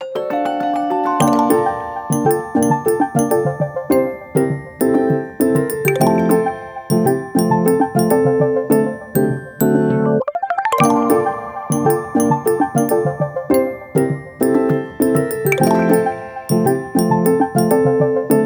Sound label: Step out